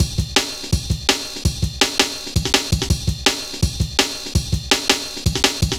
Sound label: Rollin' Ride, Jungle Warfare, Drum Loops, 165.5; Zero-G Jungle Warfare 1, 2 & 3